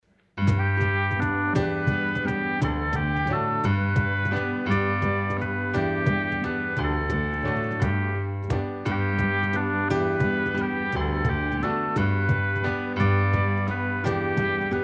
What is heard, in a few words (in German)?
Musik